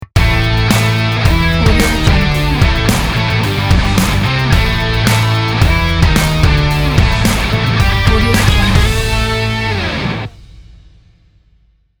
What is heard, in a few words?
rock stylish logo